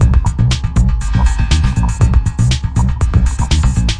120 puppy seven loop, sampled music loops

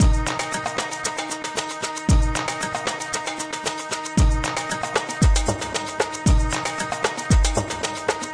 115 mideast drone beat; WORLD LOOPS

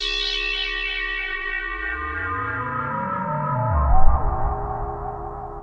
warp sweeper; Various Strange Sounds and Effects

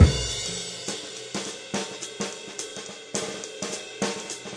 105 bpm swingy jazzy, drum loops and breaks

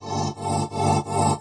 pad cutoff rhythm loop

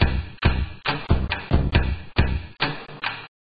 weird klompy loop, drum loops and breaks

